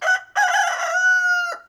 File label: Rooster Crowing